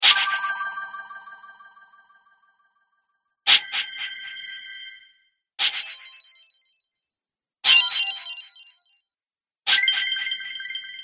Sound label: Coin Magic Collection, magic sound effects